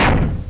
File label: CLUNK; Gunlok